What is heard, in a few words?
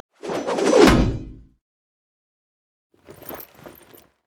emote laugh; Xin Zhao